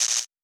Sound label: Electricity; Survival Game Sounds - Lite Edition